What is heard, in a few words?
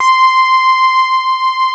MOOG, Analog; USB Soundscan vol.49; Analog Fantasies; AKAI